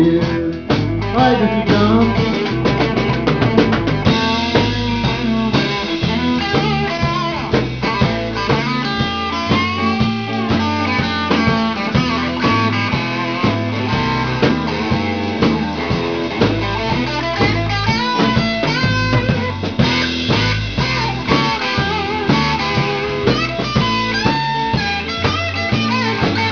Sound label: solo